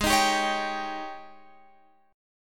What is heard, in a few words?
G sharp Major 7th Suspended 4th, Guitar, Open F; strummed; x,15,15,14,16,14; G#M7sus4